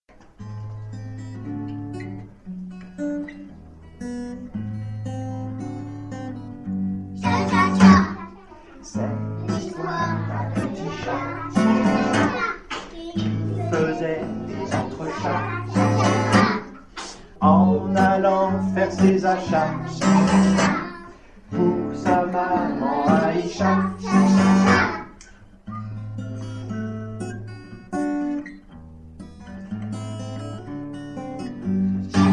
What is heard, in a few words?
cha cha cha